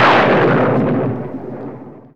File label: Thunder; RPG